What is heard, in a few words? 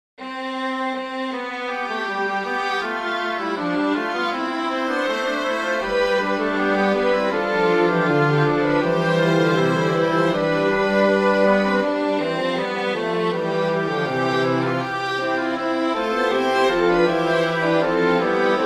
kyrie eleison, winds strings; lotti antonio; from the mass major for winds strings